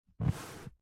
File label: Office Chair Down